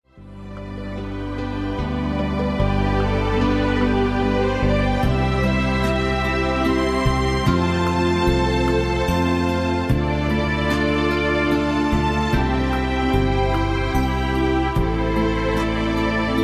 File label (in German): Nordlichter